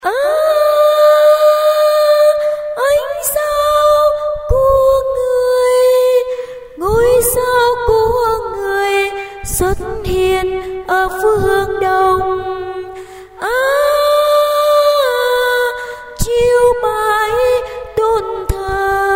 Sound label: tenor